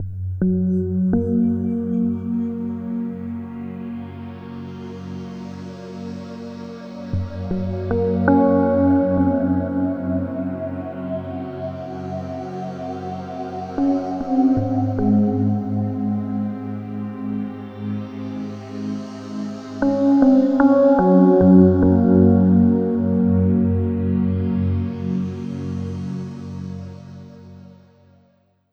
Ambient Keys

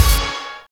HIT, Orch Hits; Roland - Brass, Strings, Hits and Combos; Orch.Hits 1

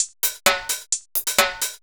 130 trance break, Drum & Perc Loops; Trance Explosion Vol1

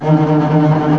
VBT G#4, STRGS; East West; Global Sounds

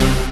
RAVE, RAVESYN

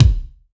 BD, BASS DRUMS; EdgeSounds

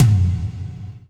Low Tom, Percussion; Giga Samples Collection; ELQ-DrumQit

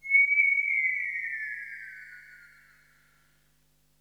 TROPIC 2, ATMOSPHERICS

